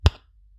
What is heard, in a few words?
Move, Chess; Chess II Counterattack